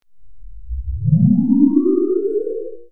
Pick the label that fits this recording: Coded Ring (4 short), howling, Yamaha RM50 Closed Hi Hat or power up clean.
power up clean